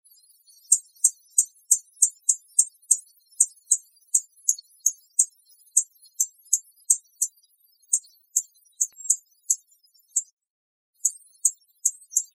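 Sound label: Anna's Hummingbird chips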